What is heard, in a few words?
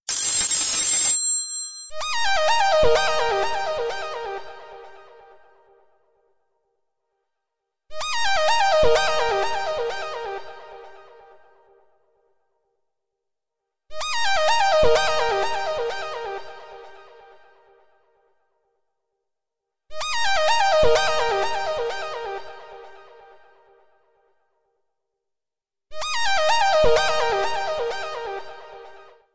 Synt Square